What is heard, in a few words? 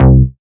Bass C, Offbeat Bass; vengeance essential club sounds-3